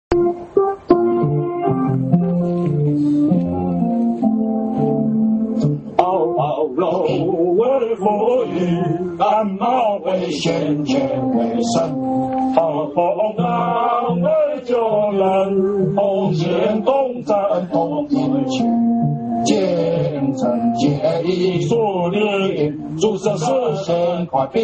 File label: hymn; worship